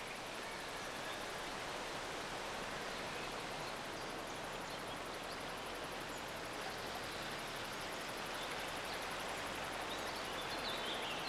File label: Ambiance Wild Oracles; music; SandBox Musics